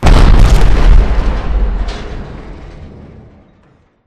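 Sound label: explosion mid distant kaboom; corporate; Titanfall 2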